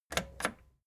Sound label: record player play click slow